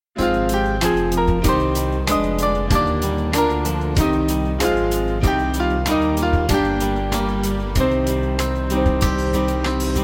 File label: Band